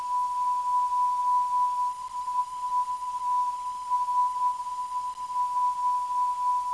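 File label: non-directional beacon; ETN; 410kHz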